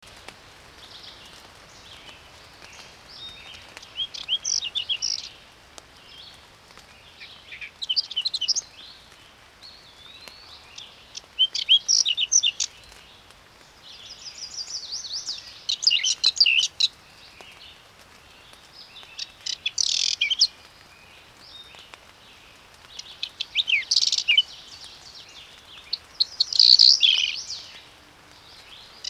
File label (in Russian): lugovoy chekan golos